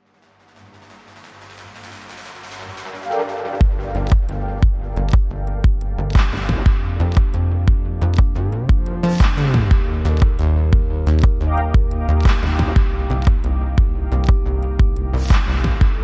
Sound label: Main Electro Melody